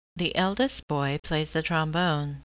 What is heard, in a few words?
trombone